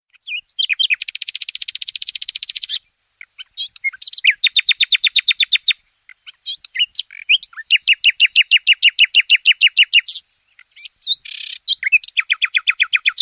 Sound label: Nightingale